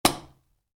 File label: Spray can lid off, Spray Can: Remove Lid; Spraying Sound Effects; Spraying (Sound Effects:Objects); Stuart Duffield